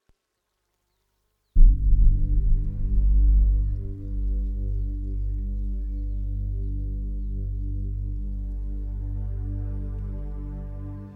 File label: Forest breath